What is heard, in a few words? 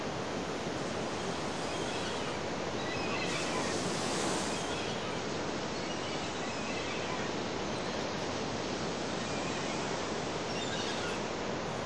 WATER HIDDEN PIER